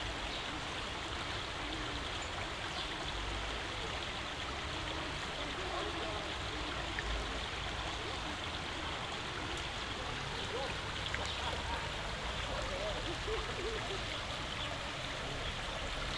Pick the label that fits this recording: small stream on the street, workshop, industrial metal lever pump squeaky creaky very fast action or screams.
small stream on the street